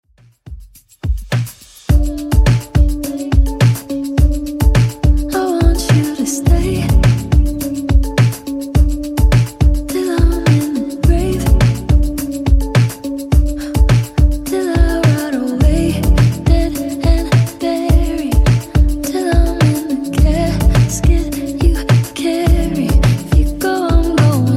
Top40 Redrum 105bpm

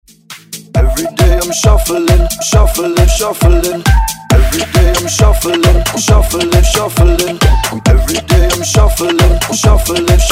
House Redrum; House Redrum Dirty

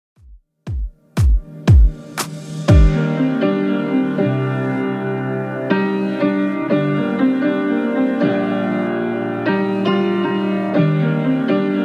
2k Redrum 119bpm

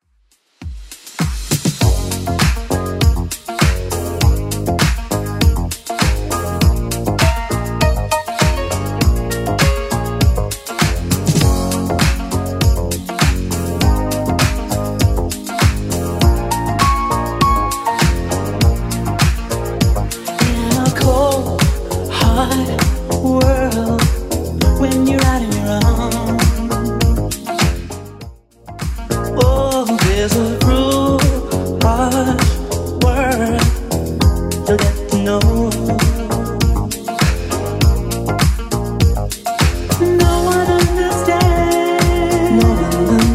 80s Club 100 bpm

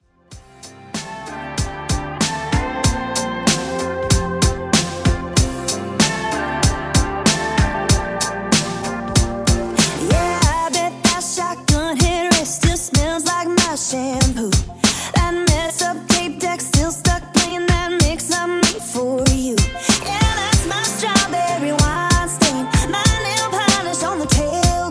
Country Redrum 95Bpm

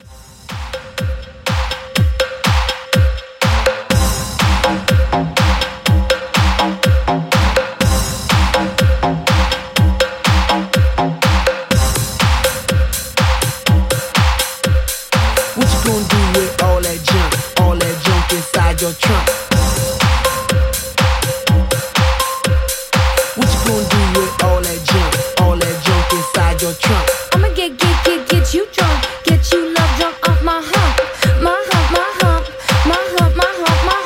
House 123Bpm

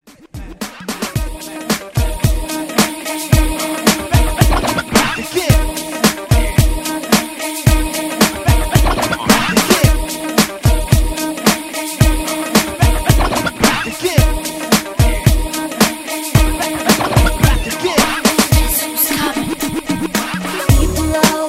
Rework 110; Redrum

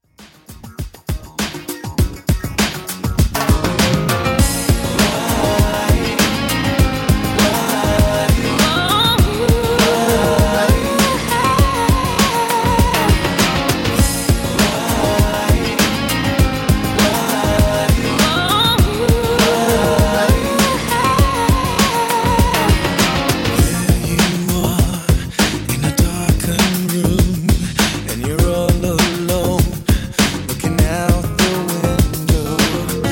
Redrum 100Bpm

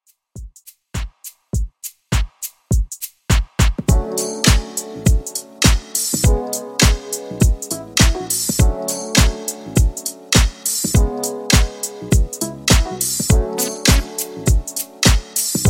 90s RB redrum 102 bpm

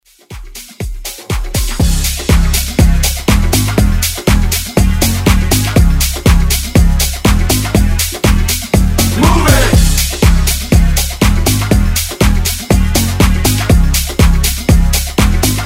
House Redrum

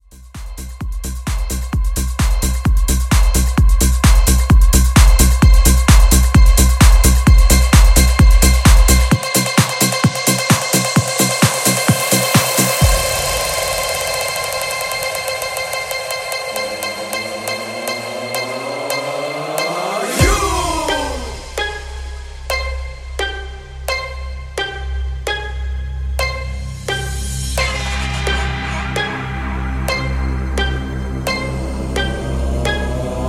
Bass House Intro; Remixfreakz

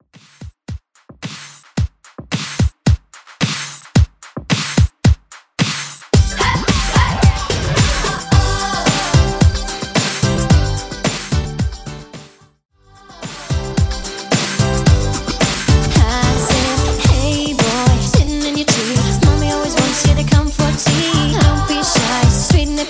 90s Re Drum 110BPM

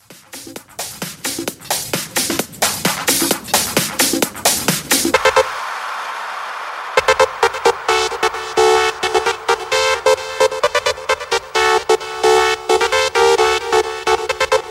90s Hard Trance VS House 131 BPM

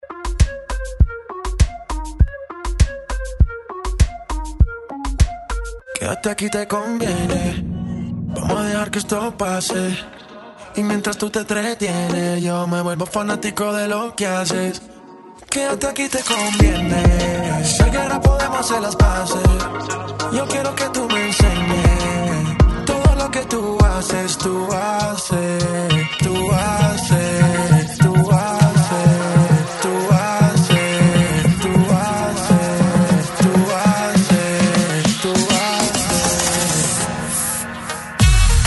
Moombathon 100Bpm; DJDOLLS

